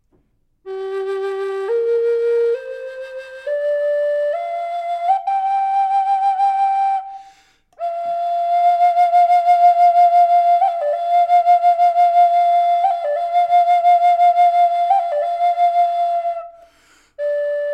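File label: natural, wood flute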